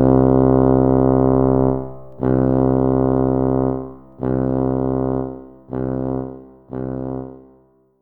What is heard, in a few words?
Tired